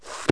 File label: thrw stop, cartoon; Wired for Sound Pro CD; Aristosoft, Inc.